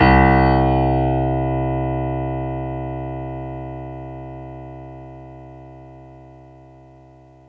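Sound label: grand piano C1; AKAI S-Series CD-ROM Sound Library VOL-8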